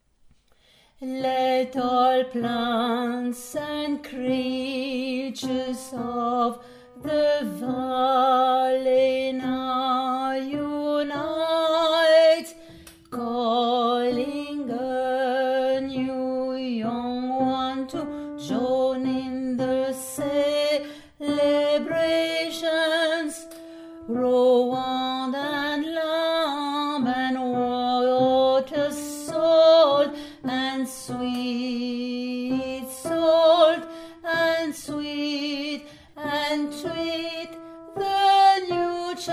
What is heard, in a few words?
lullabye alto